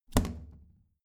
plastic trash can close heavy

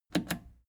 plastic button scratch smooth click disable fast

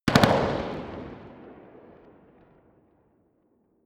smg uzi 45 reverb tail

